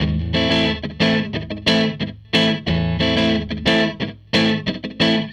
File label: Strat Guitar Loop